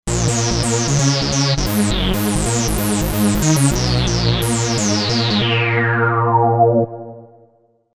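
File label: Lead Fusion Tekk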